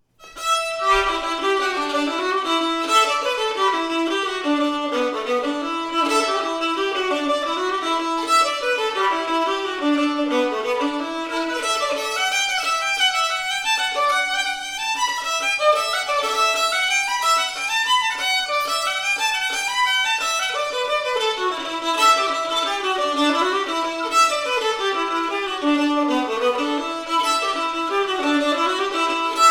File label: fiddle; blackpig sugarloaf fiddle